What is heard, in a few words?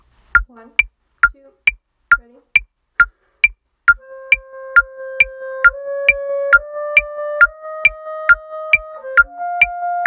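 clarinet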